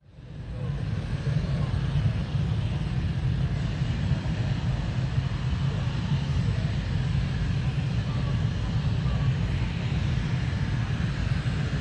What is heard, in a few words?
engine start distant; sonniss gdc